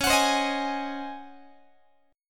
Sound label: Db Major 7th Suspended 2nd Suspended 4th Db Guitar; x,21,21,22,19,19; DbM7sus2sus4 strummed; JGuitar